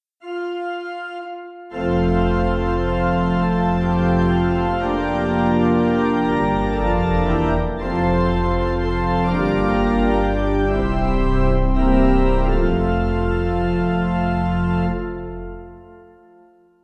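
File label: Pipe, Free Music Backing Files for Churches; Organ; Domine Deus; Crowther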